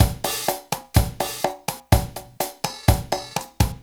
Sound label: Vaguely Ethnik Drumz-C, 125; Zero-G Kocktail Kollection; Construction Kitz (Lounge)